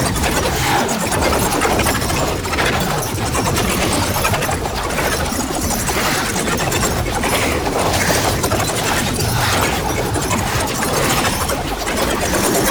Flying Center Loop; Warframe; Uriel; Infernalis

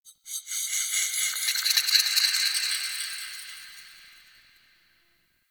Sentient Texture Small H; Warframe; Sentient Lair

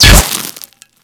Bolt Impact Stone; Warframe; Cross Bolt

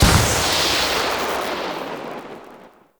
Lightning Machine Strike; Ambience; Props; Warframe; Entrati

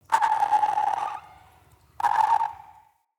Creature; Ambience; Corrupted Ode Two

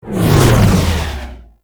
Motorcycle Tunnel Exit; Warframe; Nineteen Ninety Nine

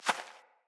Fawn Footstep; Foley

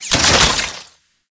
Zipline Stop; Enemies; Gameplay; Warframe; Lasrian Soldiers

